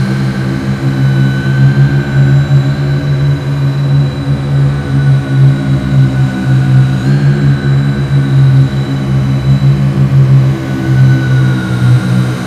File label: giant fan scifi turbine; Titanfall 2